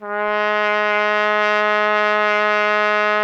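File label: BRASS TRUMPET G#2; Roland LA; ORCHESTRA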